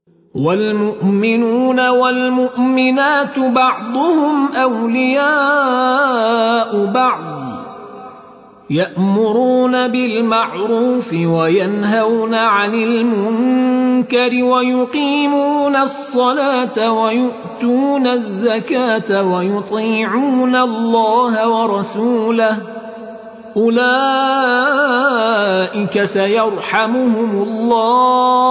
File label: Tar